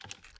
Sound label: Inspect Close; Valorant; odin